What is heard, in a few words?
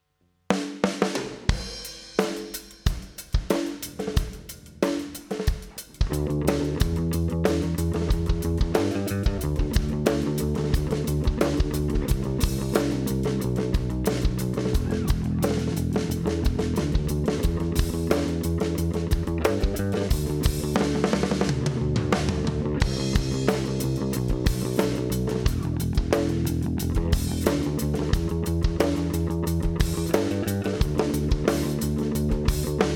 Bass Aroused